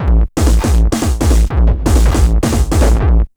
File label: TH 909 160, Hard & Loud Techno; USB Soundscan vol.01; AKAI